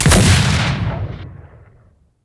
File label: skill breaching round